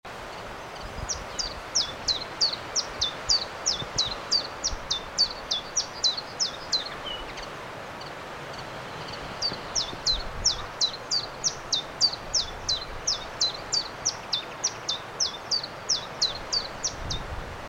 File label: ChiffChaff song type2